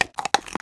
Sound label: foot; spider